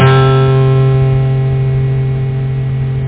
ACOUSTIC; Groovy Bytes: Behind the Moon

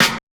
SNARE, Drum Bass, 160; eJay

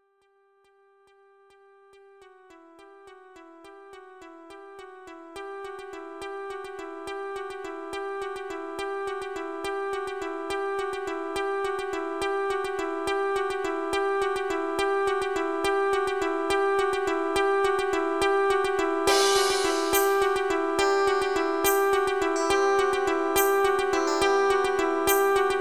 BELLS

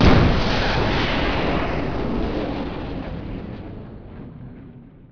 Launch; PC Gamer IT CD 41

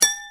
knock

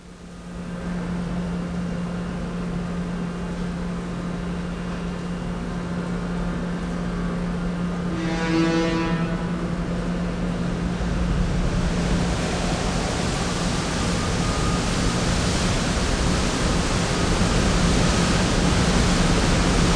ferry; WAV Sound Effects; transpo; sea

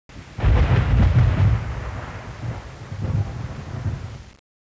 STORM; ambient; weather

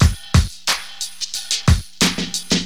Drum loop, HipHop; LiveAct Pool 1

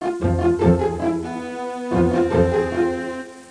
orch

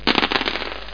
tern

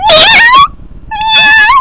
cat

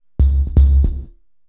SNTHBASS D+B 160 C, Drum Bass, Bass

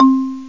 Marimba; gmplay

